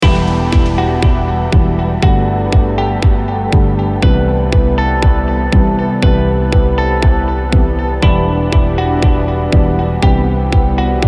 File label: Highway (120BPM)